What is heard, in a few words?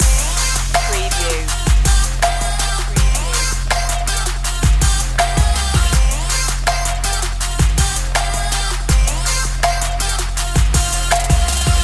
trap this loop